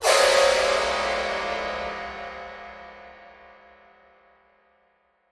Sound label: Piano Strings, keyboards piano